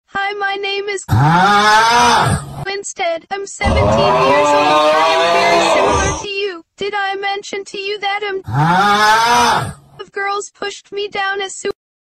aughhhhhhh

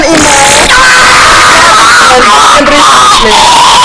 man scream

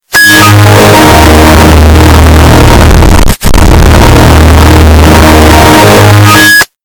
windows xp shutdown loud

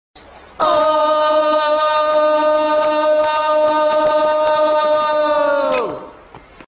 oooooooooooooooooooohhhhhhhhh; regular show

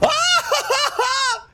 hunter laugh

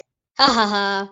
hahaha, laugh

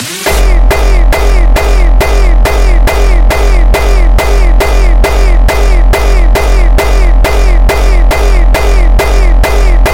hardstyle

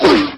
zombie hurt

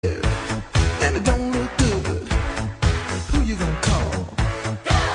song

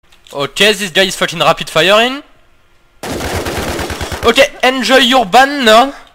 rapid fire macro; the newz

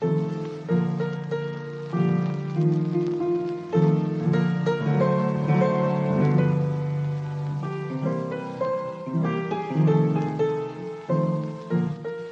dont deal with the devil piano; cuphead

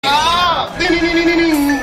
ding ding ding ding ding; yu-gi-oh duelist

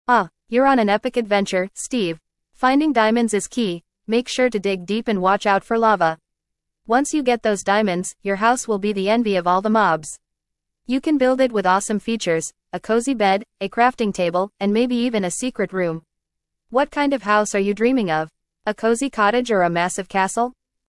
aaaaaaa

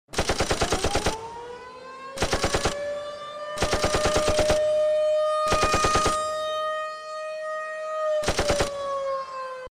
siren

